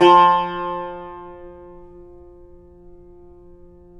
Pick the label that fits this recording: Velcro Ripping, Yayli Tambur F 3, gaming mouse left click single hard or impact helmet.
Yayli Tambur F 3